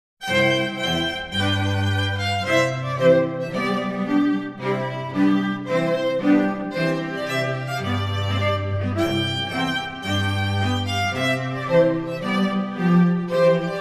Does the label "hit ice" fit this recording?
No